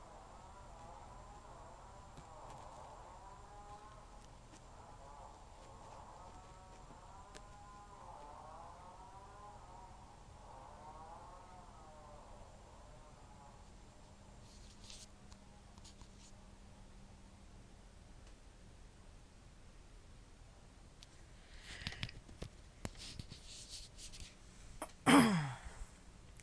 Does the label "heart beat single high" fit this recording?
No